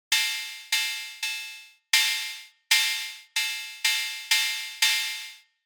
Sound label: modulair ride